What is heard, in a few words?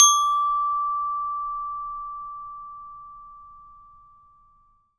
ORCH BELLS E; ILIO